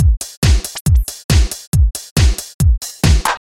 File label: beat electro